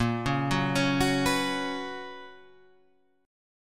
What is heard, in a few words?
B Major 7th Suspended 2nd, chord